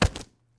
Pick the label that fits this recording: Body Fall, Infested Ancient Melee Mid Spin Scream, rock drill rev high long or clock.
Body Fall